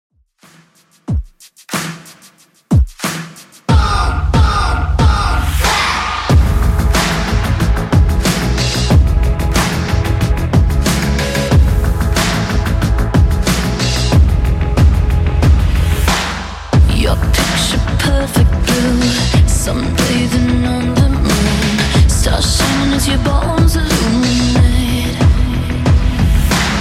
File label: 2ks Redrum 92bpm